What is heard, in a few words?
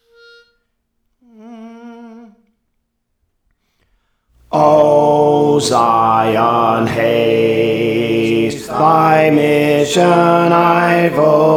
Alto, Songs; O Zion Haste; Praise For The Lord Training